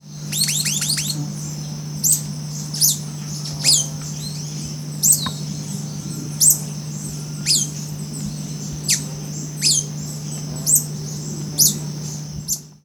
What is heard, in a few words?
Ramphodon naevius; EE Jureia; SP; BR Silveira